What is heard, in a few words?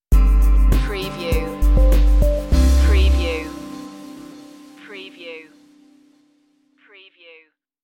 ocean breeze stinger